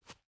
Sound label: footstep boots concrete jump start; Rust